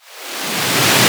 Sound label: Misc Short FX